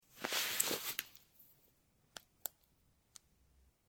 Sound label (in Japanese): ベッドの上のスマホを取ってタップする音